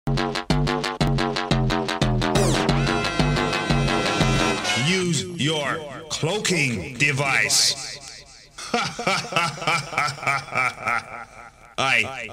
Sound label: vocal mix